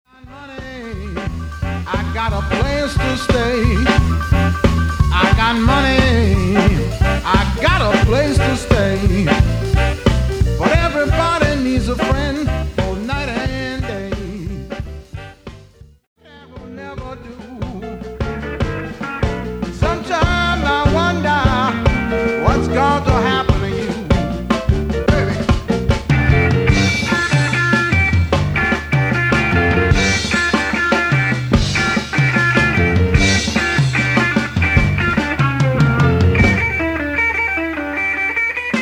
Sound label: blues, drums